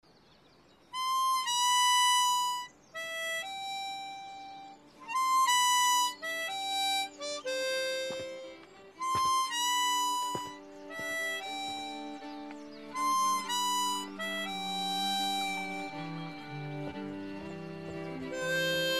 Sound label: harmonica; Snufkin; Moominvalley